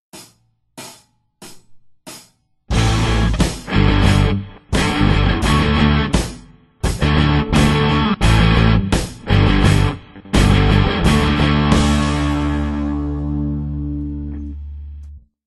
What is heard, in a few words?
Finishing Move! (Guitar Herrow); Hell Yeah! Wrath of the Dead Rabbit